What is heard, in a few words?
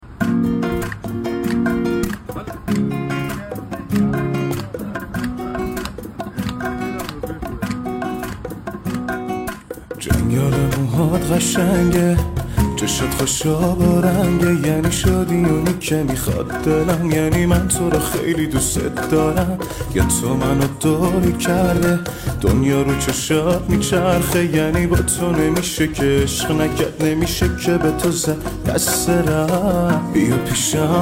Guitar